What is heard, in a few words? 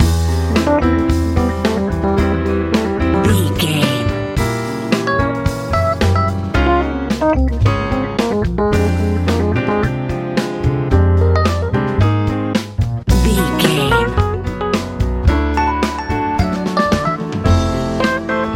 hopeful warm swirl big life lite pop